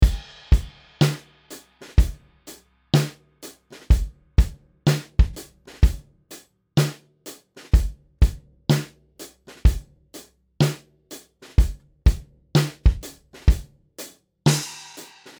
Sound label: loop untreated